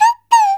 CHINA, Heart of Asia; Spectrasonics; CHINAFLT

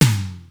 TEKNO; Rubber Chicken Software Sample Bank

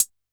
G#2 Analog HH Closed 2, Yamaha PSR-E323

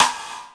SIDESTICK; InVision Interactive; Lightware VOL-1

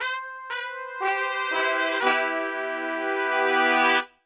FANFARE LICK, Brass; Best Service; Brass Super Section

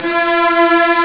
Strings, Amiga Soundtracker; ST-XX Amiga Soundtracker Sample Packs

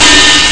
hihat; Amiga Soundtracker Sample Packs

